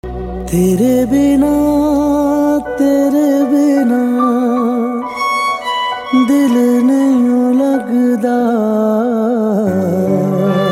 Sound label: sad ringtone